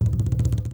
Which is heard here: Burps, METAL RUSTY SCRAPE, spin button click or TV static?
spin button click